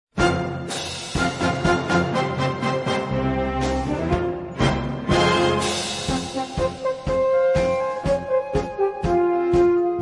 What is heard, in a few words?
instrumental; sgi songs